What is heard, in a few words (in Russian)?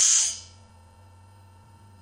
zatyanuvshiysya vyidoh meditativnoe shipenie